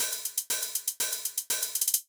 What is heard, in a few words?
perc hats